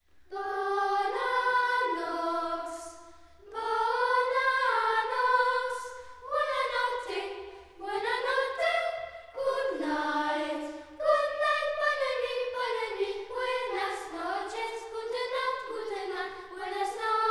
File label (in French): Choeur Sans Canon; MOZART; Bona Nox